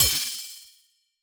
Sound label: cold break; CrossCode; puzzle